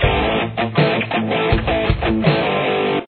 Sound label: chorus guitar